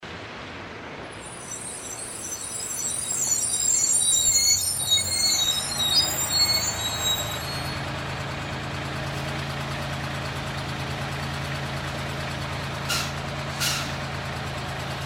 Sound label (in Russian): vizg tormoznyh kolodok